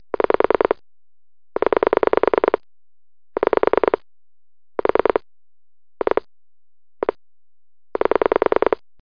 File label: pulsed